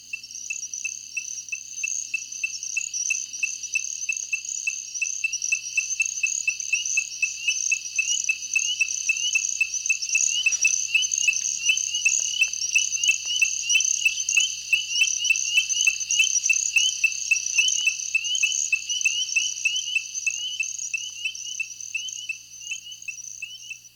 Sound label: ornate chorus frog